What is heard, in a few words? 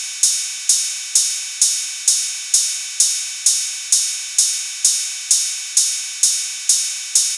Ride Loop 130, Techno; Techno Sounds 2024; Rides